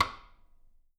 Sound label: SN OFF CROSS, snares; Sampleheads; Peter Erskine Living Drums